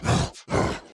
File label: Enraged Breathing Loop; The Town